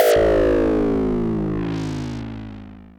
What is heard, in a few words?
FX, Dual Percussion Synthesiser; Klone Dual-Percussion-Synthesiser